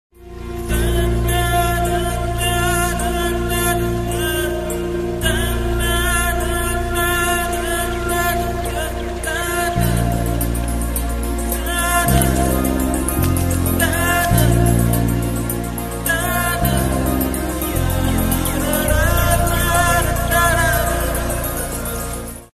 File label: ringtone indian bgm